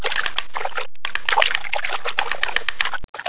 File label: Otter Splash